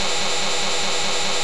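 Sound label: begin slide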